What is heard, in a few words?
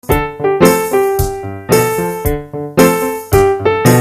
piano